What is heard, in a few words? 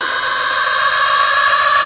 Electro FX; Ueb HE Electro FX